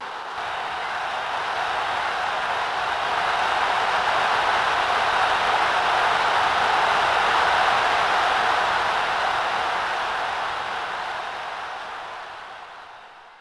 BUILDUPS

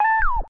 new server event; Konnekt

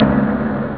gated, synth bass

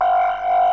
Computer Tone; Defiance

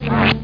penguin born; WormWars

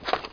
run desert; specops

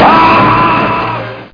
cry

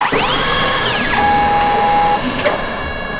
ship wings open; Earth and Beyond; EA Games

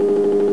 fuel loop; Superbike 2000

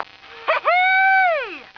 heheee; stars; taunts

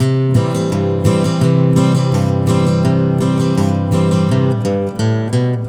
Country, Acoustic, Guitars; ACID MUSIC

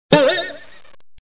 hop; How Many Bugs in a Box; juicebar

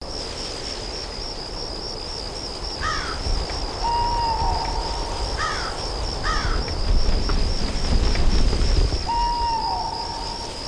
Atmo

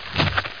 gravel roll; heretic ii demo; player

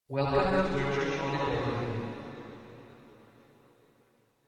Voice Concert Hall

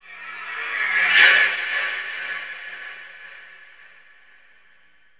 Space SFX; The Official Guide to Babylon 5

